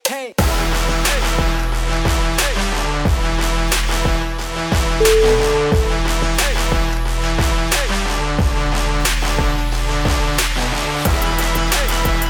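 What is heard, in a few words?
big trap beat, music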